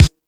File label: Lotsa Kicks, Drum Machines E-MU Pro-Cussion